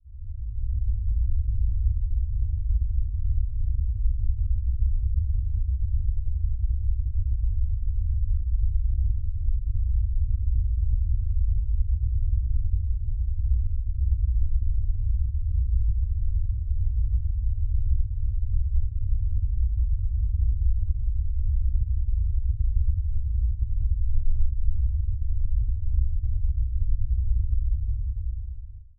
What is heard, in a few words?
ambient music; Magic GameClient